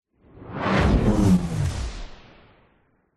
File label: fantasy magic spell whoosh ball of energy pass by fizzle out; zapsplat